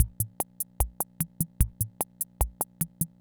Kent K-220 Rhythm System Loop, drum machine

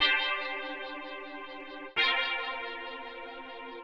125 Soft pad C, Keys, Nu Jazz House; PowerFX - Nu Jazz House

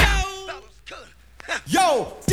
Loops; Polyend; Loops n trouble

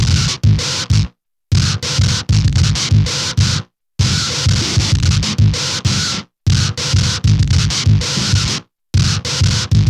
FUNK ON FIRE; Distored Reality

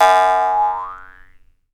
JEWS HARP

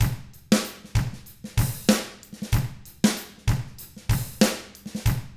95 FUNK, Drums; ILIO; Double Platinum Drums 1

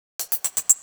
Rhythmic Fill; Sonic Foundry (Sony Creative Software); Loop Noir

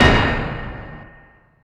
tyre, Impacts, Hits & Booms; Distored Reality

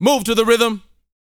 VOCAL F; ILIO

